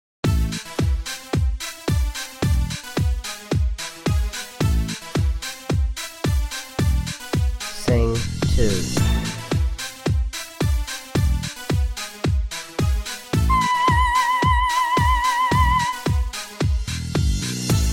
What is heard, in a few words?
Soprano Key of A, dance and techno music; Dance and Techno Music Ear Training; Practice Perfect